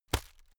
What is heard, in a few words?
hit plastic fleshy